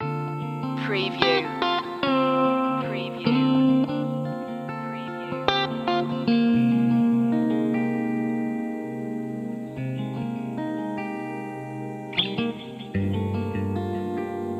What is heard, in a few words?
guitar stories loop